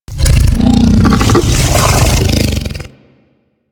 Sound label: Vocal Captured Idle, critter; Warframe; Hunting Critters; Undazoa